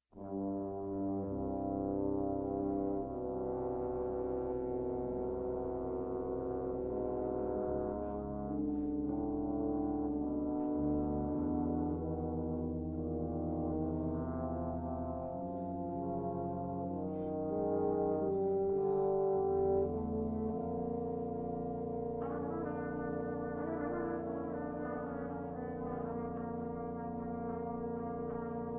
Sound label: tuba; Laudes; Reveille